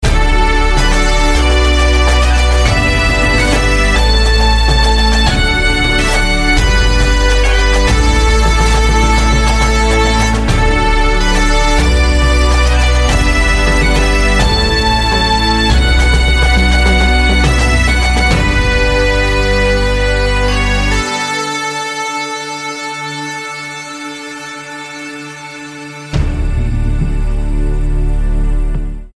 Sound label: bagpipes